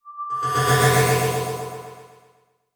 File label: Transition Hit Impact; Adobe; Production Element